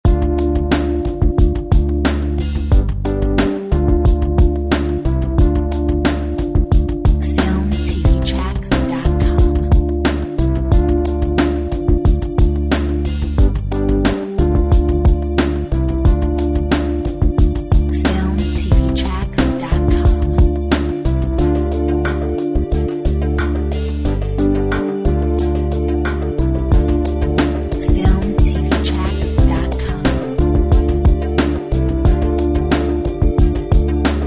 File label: Technology Lounge